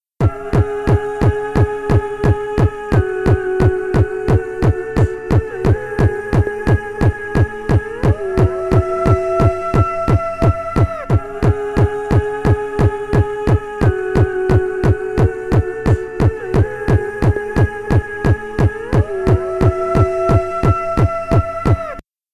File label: Medicine Man Beat